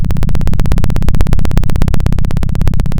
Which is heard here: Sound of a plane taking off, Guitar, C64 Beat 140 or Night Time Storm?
Sound of a plane taking off